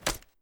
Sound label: equip